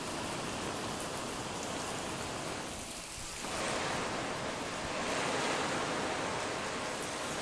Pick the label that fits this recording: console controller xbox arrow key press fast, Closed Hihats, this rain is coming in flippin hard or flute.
this rain is coming in flippin hard